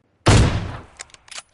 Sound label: message tone; pubg; awm